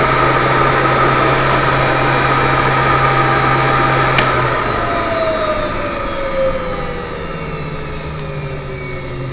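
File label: vacuum cleaner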